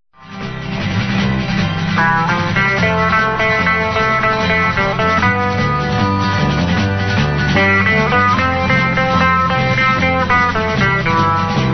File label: interval signal; cybc1